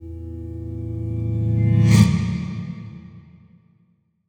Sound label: guitar ac swell processed; 3maze; Ac Guitar FX